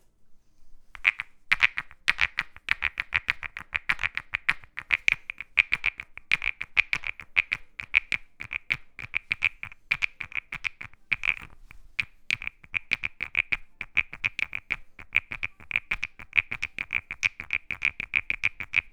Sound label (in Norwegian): frosk; logic